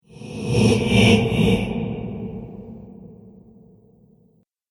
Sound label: Possessed Ghost Spirit; Ghost Sound Effects; Haunted House Or Graveyard